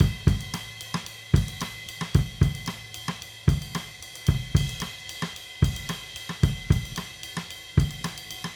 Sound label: Raw Funk Drums, 70s Analog Funk